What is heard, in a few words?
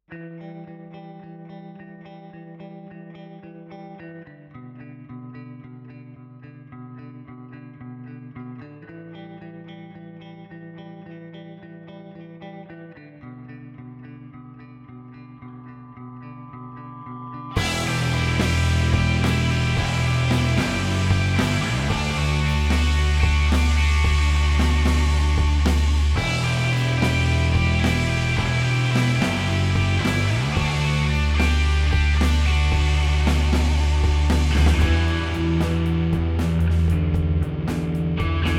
w Guitars